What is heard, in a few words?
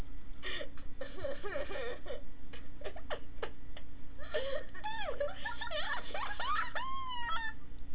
GIGGLE